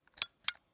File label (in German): Joystick Knopf Seite; Highscreen